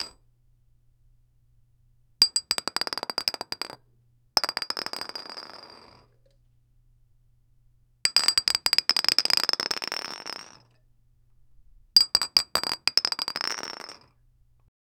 the sound of pla, bottle

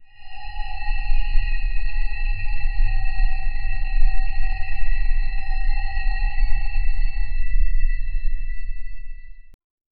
the sound of a crystal, absorb

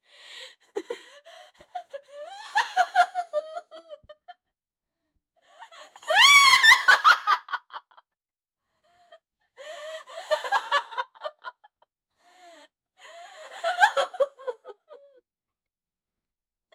female sinister laughter; vocal

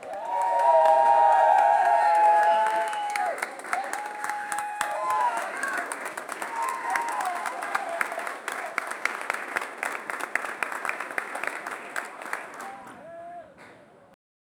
an audience of 25 people, concert

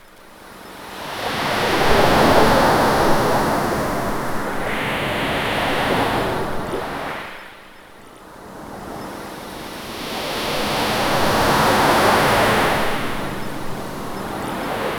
beach waves and night sky; breeze

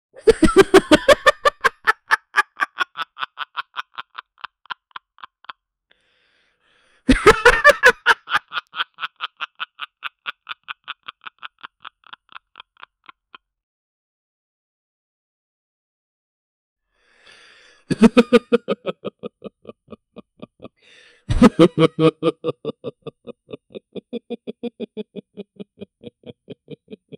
a short high pitched unea, intake

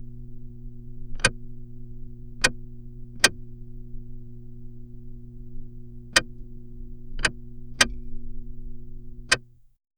a car light turning on

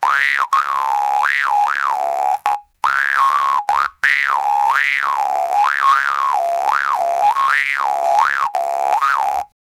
super fast boing jew harp

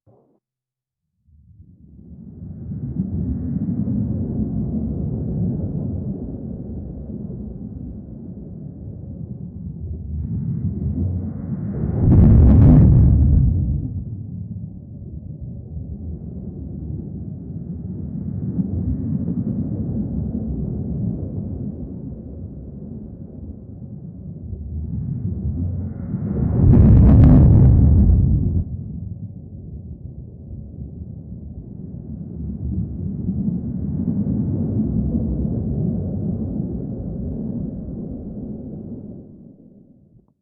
sequential tsunami sounds; disasters

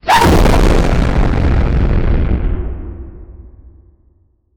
a low and deep scream, human scream

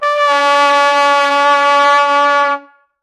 trumpet blown heavily; brass

